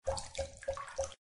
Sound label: bath tap drip into water short